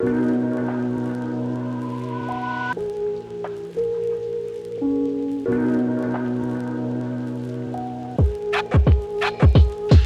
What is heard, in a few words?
Lo Fi Hip Hop; Tanuki Sunset